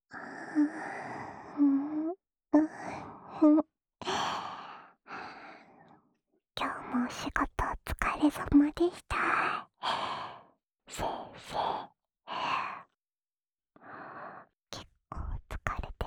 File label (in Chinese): 安眠用 陪睡循环（左侧）